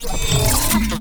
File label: Proc Block Ability Cast; Warframe; Warframes; Helminth Abilities